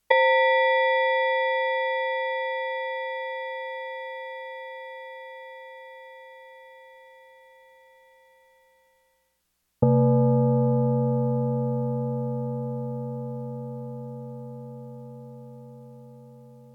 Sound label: gongs